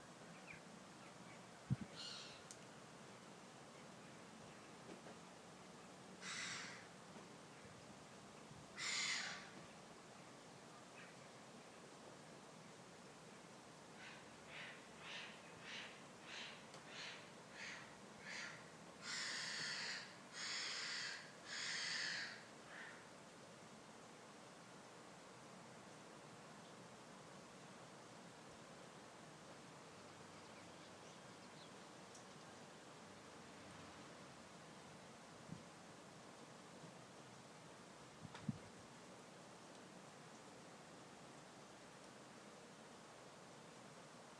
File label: after the rain birds cry